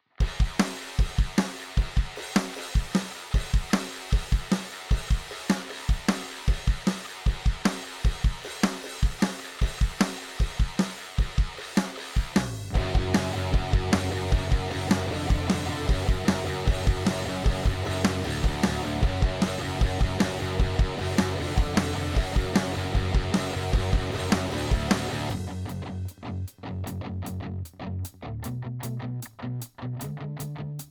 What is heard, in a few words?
SCARY CHORDS